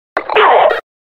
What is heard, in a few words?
hit